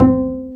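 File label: STR Cb Pizzicato, strings; Roland L-CD702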